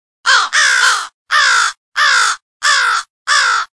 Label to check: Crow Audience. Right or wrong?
Right